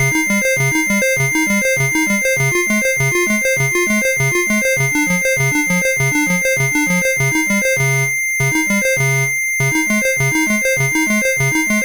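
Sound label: Preloud, MOS 8580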